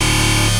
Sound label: Error